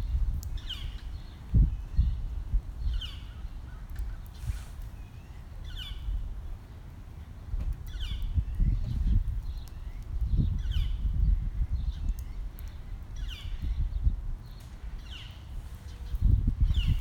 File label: bird; who can name this bird